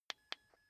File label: car key button click signal